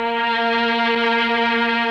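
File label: MELLOSTR, Mellotron Violins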